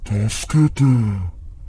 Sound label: puchi koe